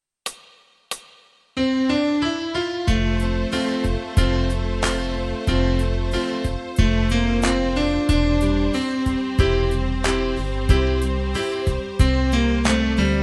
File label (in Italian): Piano Ascolto tutti